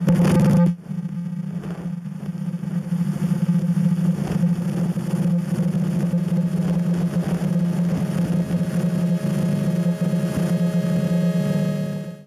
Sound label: Cantabile